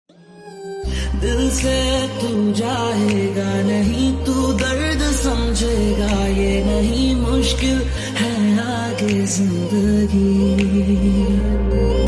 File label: Ringtone